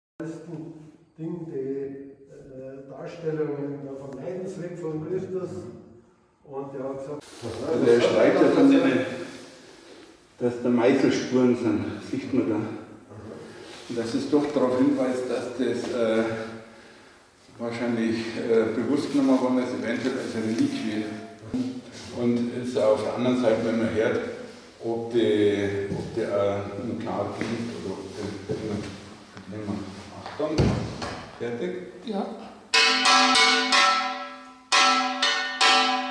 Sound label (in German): glocke; ramsach